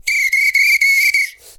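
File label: foudang groove; Best Service; World Colours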